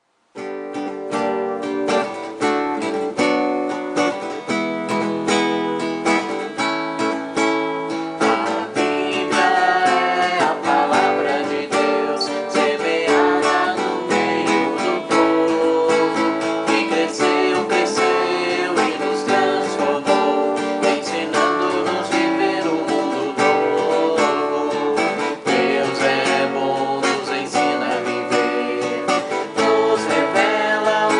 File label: vocal